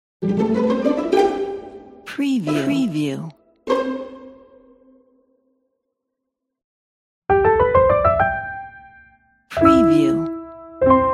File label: joyful logo